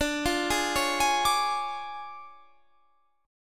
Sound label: D Minor Major 7th Flat 5th, D, Guitar Standard; DmM7b5 arpeggiated